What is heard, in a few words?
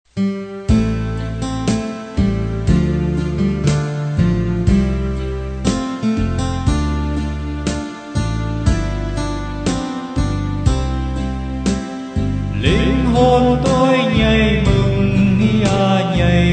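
soprano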